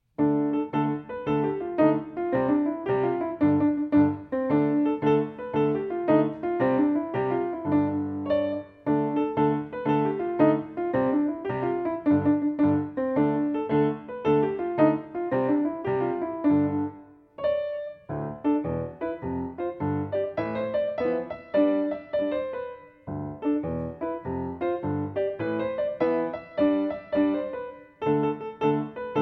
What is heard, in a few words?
Jig; Archer